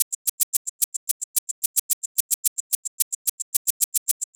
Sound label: Shaker 110, Percussion Elements